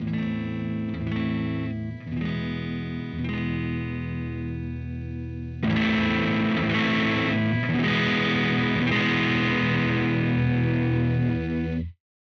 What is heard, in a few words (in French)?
effet distortion